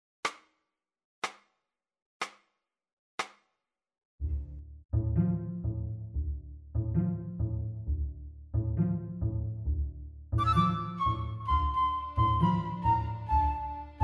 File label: flute